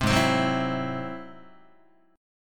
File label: Ab Augmented 7th Ab down Guitar; Ab+7 strummed; JGuitar